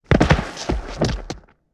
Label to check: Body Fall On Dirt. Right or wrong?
Right